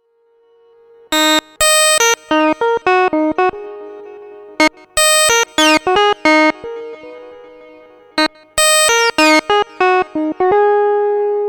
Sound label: Bending Sync Solo; GRPA4